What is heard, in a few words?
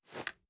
reload empty tilt, reloads; gmod